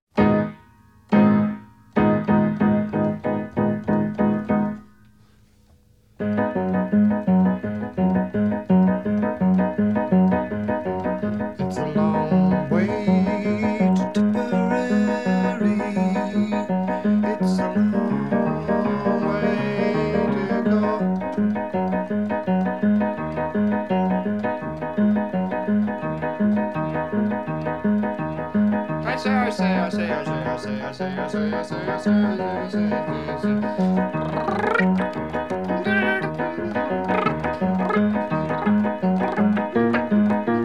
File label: Piano-